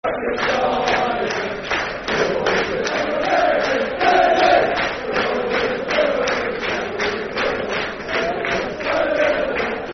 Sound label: Football Chant; Yeovil Town Ytfc; fanchants